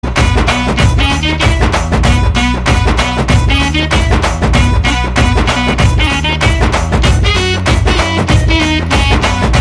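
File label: background music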